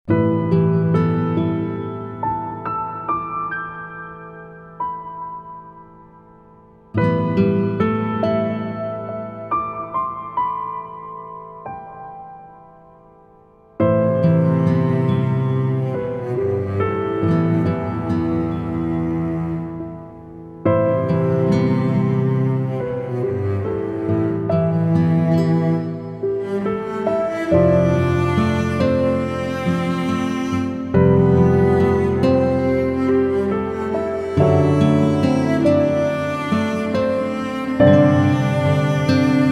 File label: Emotional Normal loop